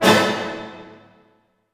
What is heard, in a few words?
D# MIN FF, orchestra hits; Sound On Sound - Peter Siedleczek's Orchestra